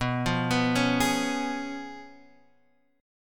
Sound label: Db 7th Cb; arpeggiated; JGuitar